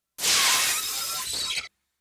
synth screech high pitch synth blast

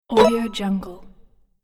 collect swoosh bonus

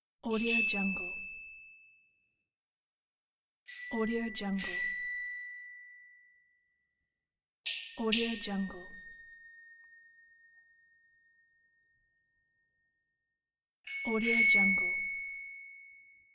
ring metal bicycle bell

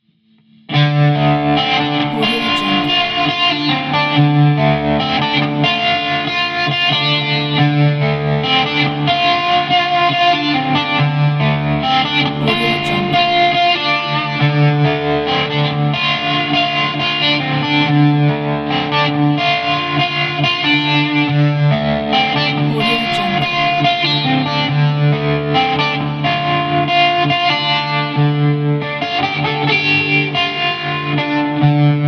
western guitar canyon blues

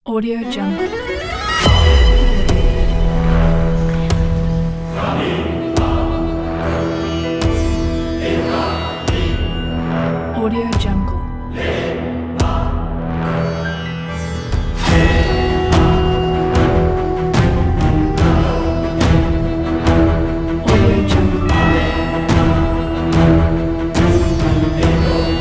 epic moment

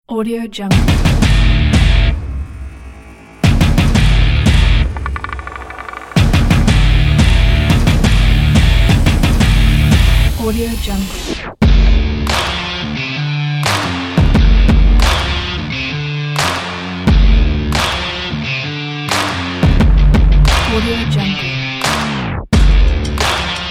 stomp rock

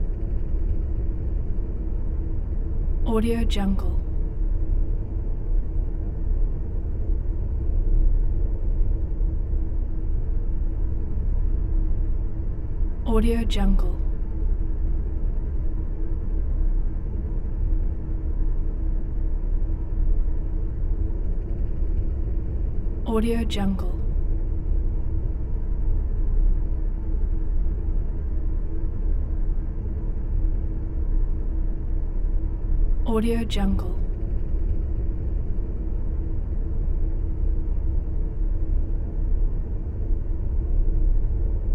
drones space dust; doom drones 2; soundmorph

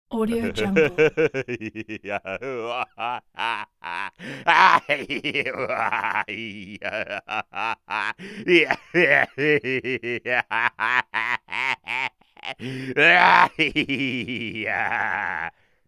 pirate rolling on the floor laughter

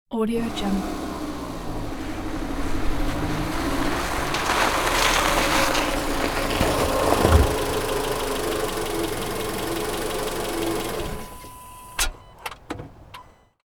car slow parking on snow

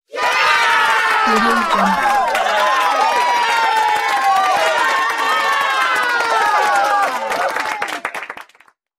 crowd applause and cheers

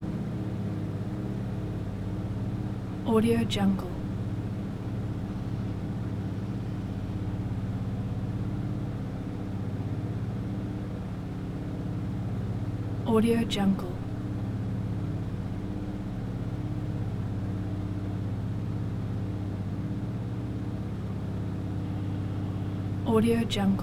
beech 18 floatplane prop plane prop plane

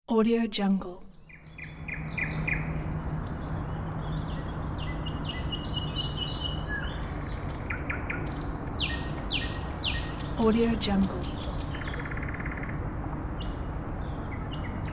morning garden ambience

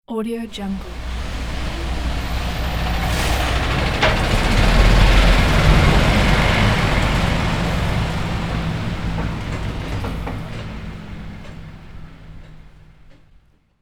farm machine passby

